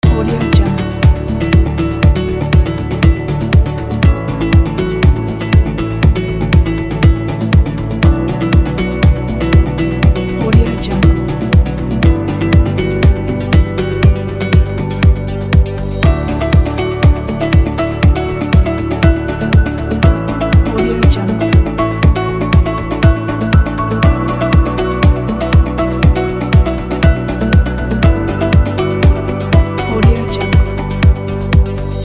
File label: the corporate loop